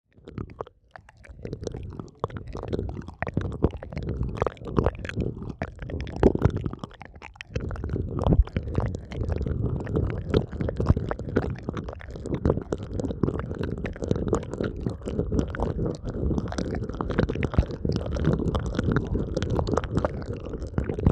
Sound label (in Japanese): 猫族のねーっとり耳舐め 両耳, ASMR